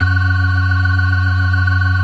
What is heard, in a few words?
FAST VIB, TREMELO+VIB, Synth and Organ; InVision Interactive; Keith Emerson